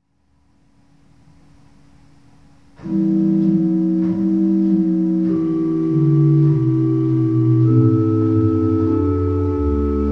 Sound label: organ